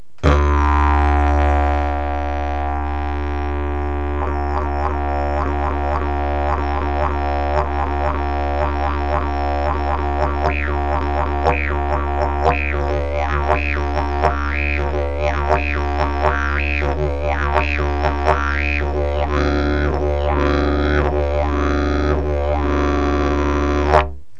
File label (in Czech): javor, didgeridoo